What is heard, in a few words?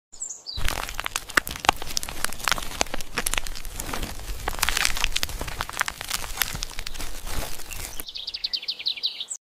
Cracking ground; سینمایی